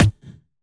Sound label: Percussions